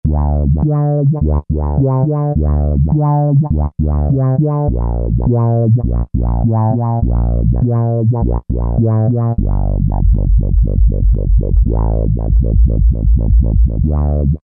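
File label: Pulsing Bass; Roland SRX-05 Supreme Dance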